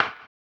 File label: HAMBONE; ILIO; Vocal Planet VOL-2; Blues & Soul